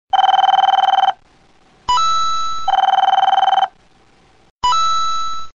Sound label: coin office phone; ringtone; mario